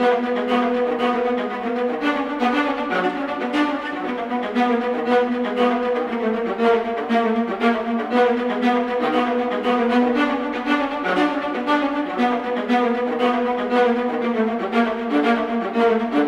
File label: viola; виола